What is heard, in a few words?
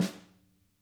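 snare soft, Interactive Drum Kits; Sonic Reality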